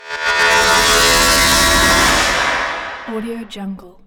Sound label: bloody nightmare psychological; soundmorph